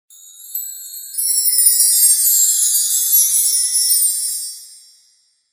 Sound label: Sms Tone